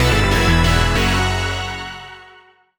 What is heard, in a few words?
Small win; netent